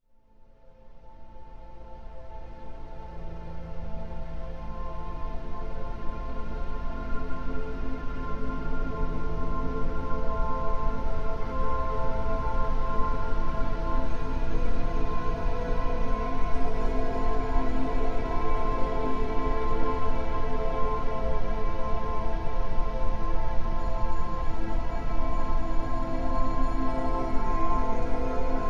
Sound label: intuition binaural